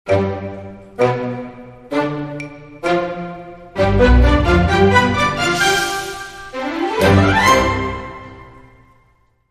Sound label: Dramedy Sting